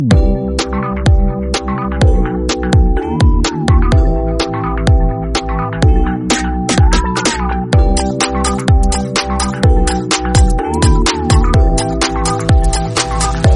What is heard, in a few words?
instrumental